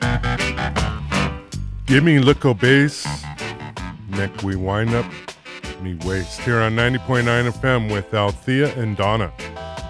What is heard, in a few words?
lickle bass